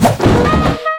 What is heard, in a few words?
Boss Attack